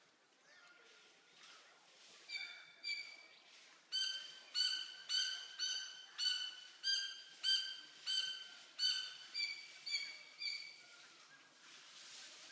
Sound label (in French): epervier a collier roux